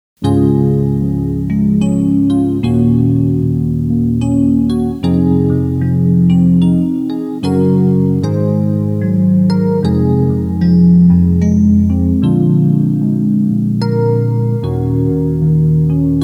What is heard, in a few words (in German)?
Suesser die Glocken, christmas